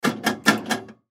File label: Ice Dispenser Push Multiple No Ice Drop; Miscellaneous Bar; Industry